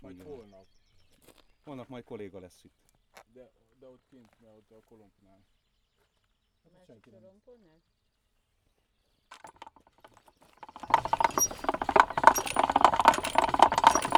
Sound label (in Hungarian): távoli sorompó felengedése, kézi tekerésű sorompó kezelése; vasut; vizvar; cardioid